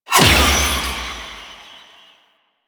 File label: Shackle Impact; Warframe; The New War; Deacons